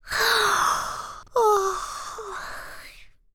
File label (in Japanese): akubi, girl; voice